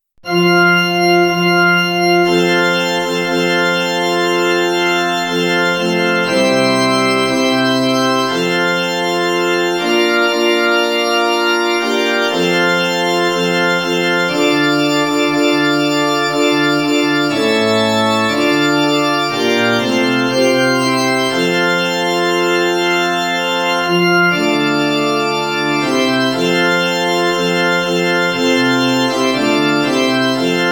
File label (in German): F-Dur Church Organ